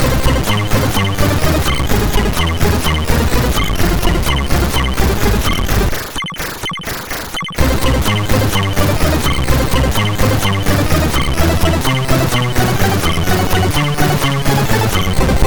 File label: Music Samples, sid, MOS6581R2